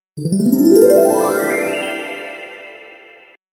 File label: pageflip magic sound; dreamy